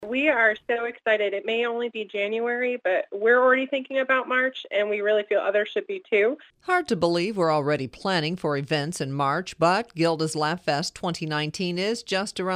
laugh

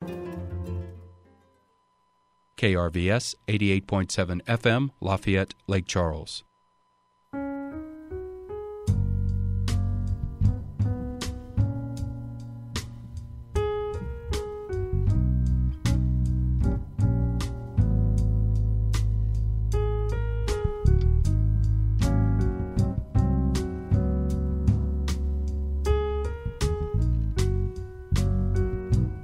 jazz

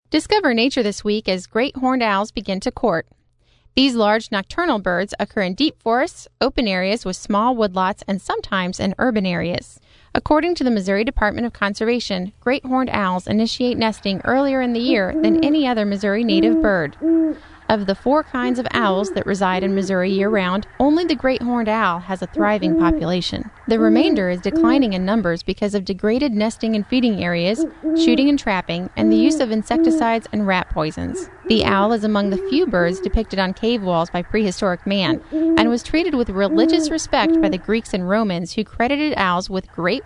Great Horned Owl

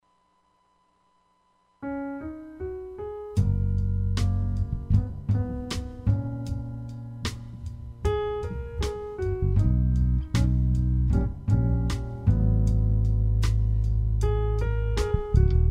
jazz

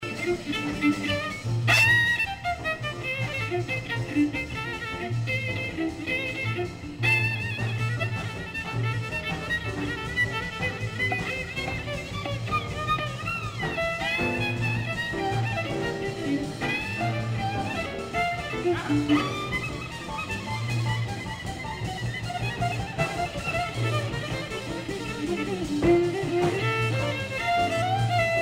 jazz

